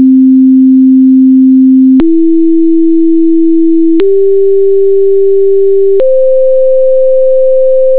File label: natural scale half